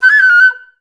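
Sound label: flutes F#5; Best Service; Peter Siedlaczek's Advanced Orchestra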